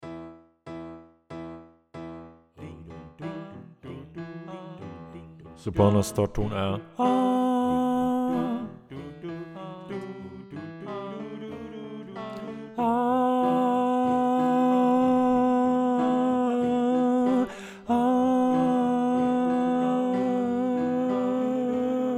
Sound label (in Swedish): sopran